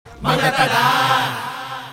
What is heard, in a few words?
sms tone